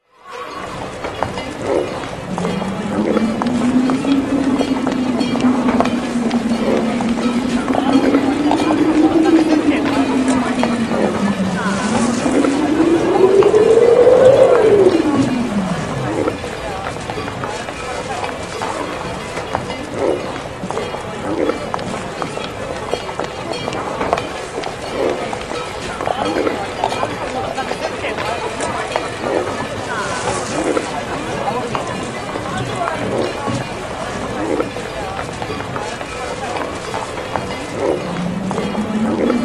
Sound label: sedaye karevan